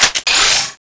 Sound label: ng7 mod, weapons